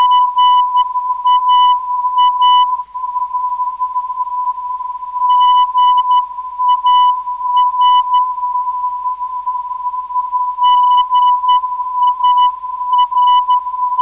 gar, ndb

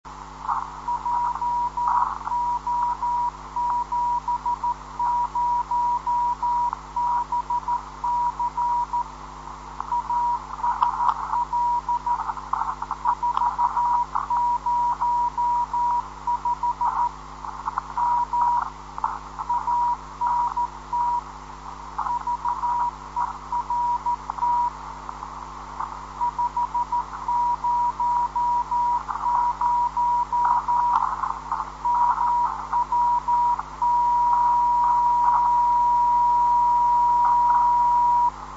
10.133kHz data

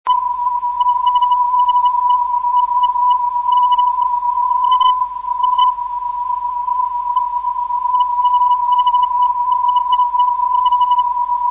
pst 338khz, ndb

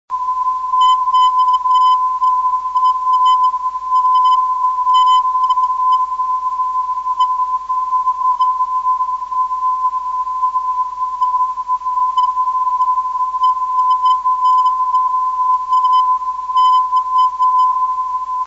353khz

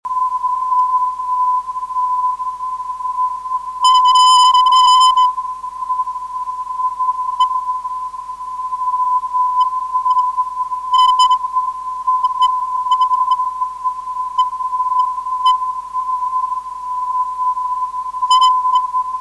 450 kHz, ndb

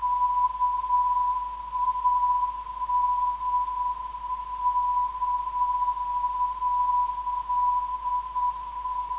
328.96kHz, NDB; KAD

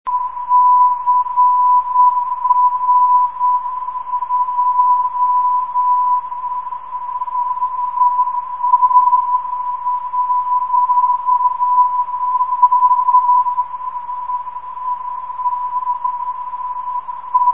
1700khz, ndb; crj

